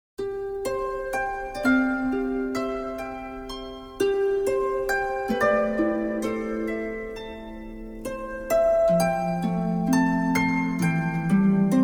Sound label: harp